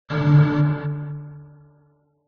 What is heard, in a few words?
showdown; Minecraft